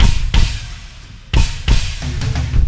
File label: Landing Party Beat, Processed Drumkits; Sonic Foundry (Sony Creative Software); Processed Drumkits Zero Gravity Beats